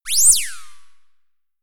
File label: notification; samsung Aleart